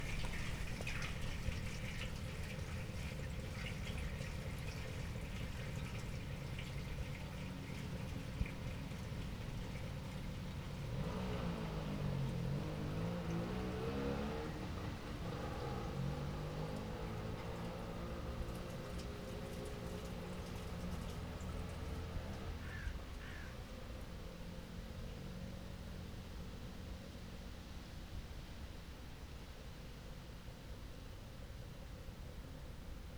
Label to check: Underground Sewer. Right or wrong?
Right